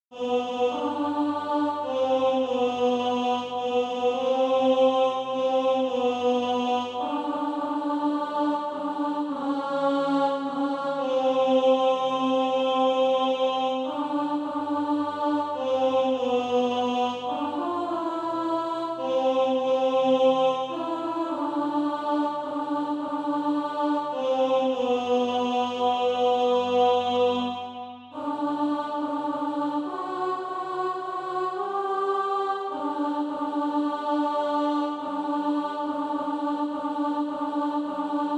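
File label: alto, Practise Music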